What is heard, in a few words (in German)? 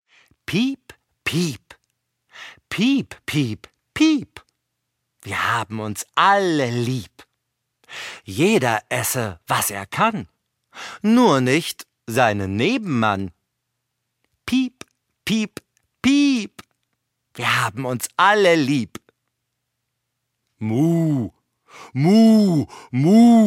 Piep piep piep; la valisette franco-allemande; chantons avec tom et lilou